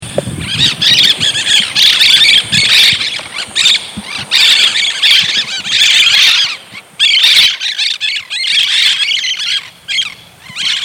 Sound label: green parakeet calls